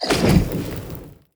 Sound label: enemy lava evolved flame; sfx